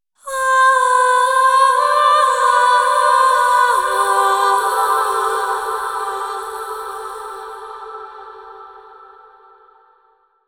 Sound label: Vocals Am 65Bpm (WET); Construction Kits; Ghosthack; SkyRealm; Kit 22 - Solace in Falkreath